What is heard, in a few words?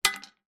stone down on metal drain cover; foley